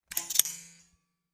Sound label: stapler spring